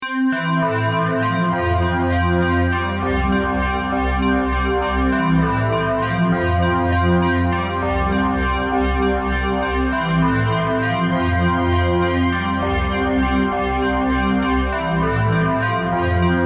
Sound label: CHARLATAN3 DISCO LENTO